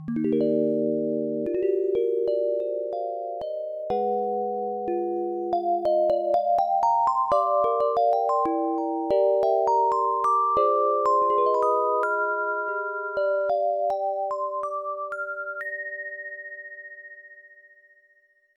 Logo; Vanslug X Mission; IBM PC AT; History; Soft Action Logo

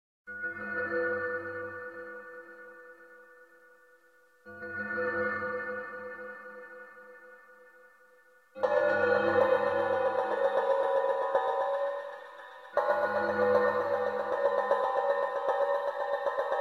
Dark Bells; Silent Hill Downpour

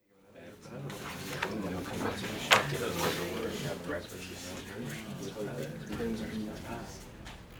crowd small intro

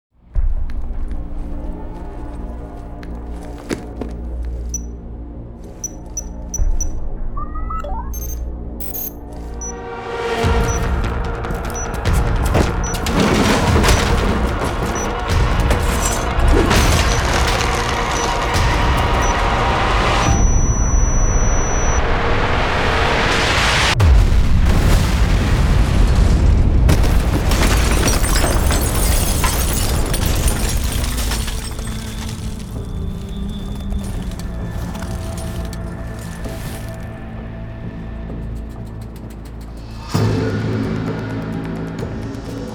portexplosion; sfx music; Deus Ex Human Revolution

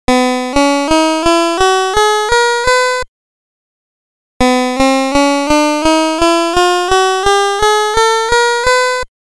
Scales; music construction set c64

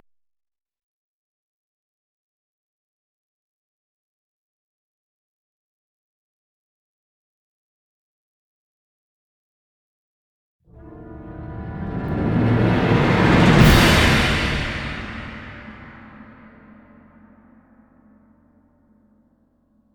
Giant Death; The Witcher 3 Wild Hunt

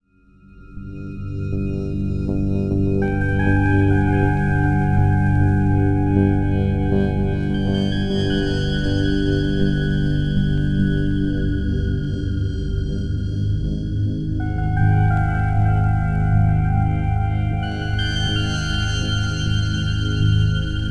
BGM Cutscene Tense No Perc; X-Men Origins Wolverine; NDS

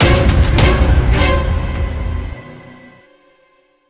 Short Signal; warface gamerip 2012

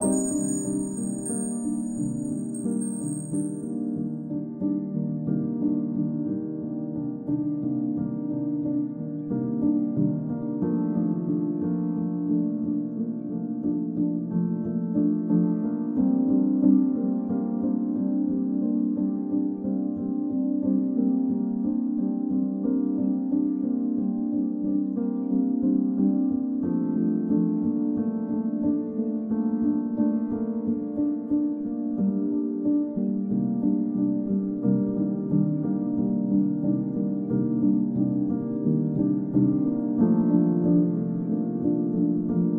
Ending Walk Piano; Blue Sheep

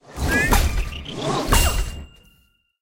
Game Intro; My Tamagotchi Forever; android; GSFX 2048 Game Intro

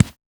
run carpet

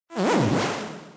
pain

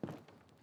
Step

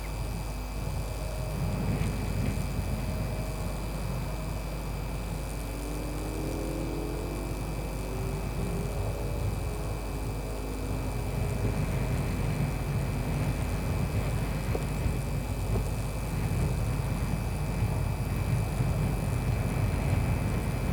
Electrical Station Ambience; backrooms 2d android ios online windows gamerip

